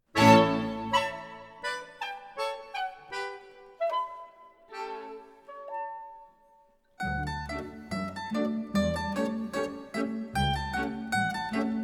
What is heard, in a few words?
polka; annen